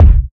low kick drum one shot d sharp key; kick drum samples; one shots

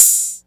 open hi hat one shot a key

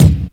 sharp kick e key; kick drum samples; drum single hits one shots

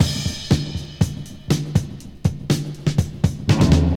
121 bpm drum loop D key, kick tuned to the D note

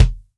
dry kickdrum f key; kick drum samples; single hits one shots

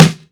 rich low end acoustic snare e key

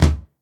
tom sound d sharp key; tom drum

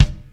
short kickdrum F key; kick drum samples

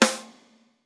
sparkly steel snare drum sample c key

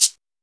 00s shaker sound sample G key; percussion

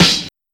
juicy snare single hit E key

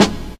perfect snare drum b key

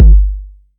long room reverb steel kick drum g key

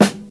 high quality dope snare one shot g sharp key

